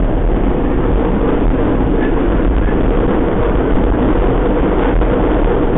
Run, Train; OpenBVE

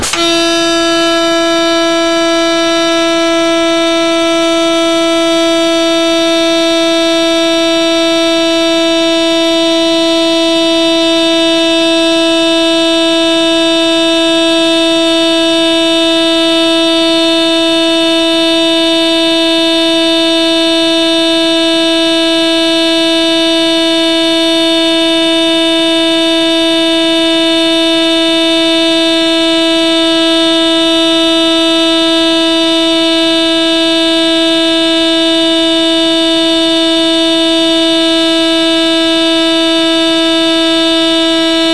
AWS, train; OpenBVE; 55022 Royal Scots Grey

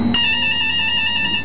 klaxon, Train; H-START Stadler KISS

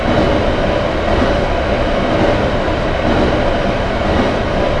Run, train; OpenBVE

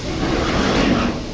Door Open, Train; M41 Bhv

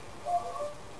Spring L, train; OpenBVE

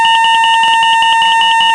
Ats, Train; Z5300; OpenBVE

